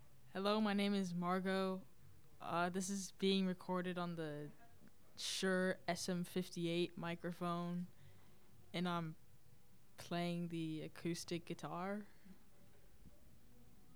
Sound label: Guitar